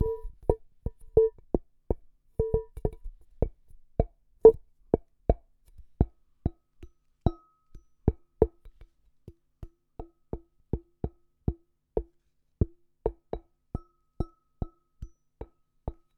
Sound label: Marimba Damped Montage Hits, Large Wine Glass